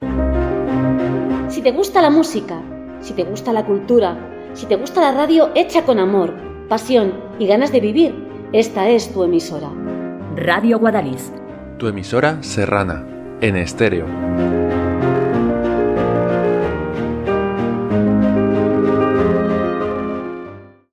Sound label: Jingle; Radio Guadalix